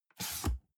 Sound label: cardboard box open unfold scrape short; objekte